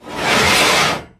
rot up, Impound

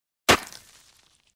hoe

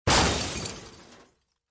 crash

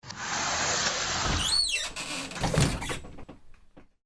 booth door

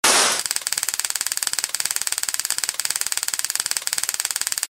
shock; Police

